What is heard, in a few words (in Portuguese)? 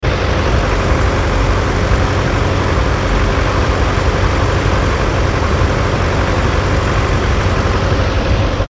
motor